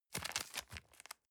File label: plastic bag small turn soft